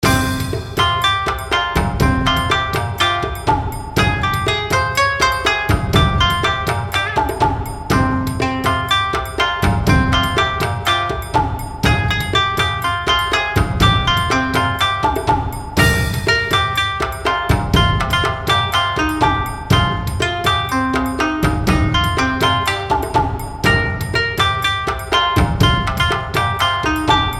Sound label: Raid; Mist Train Girls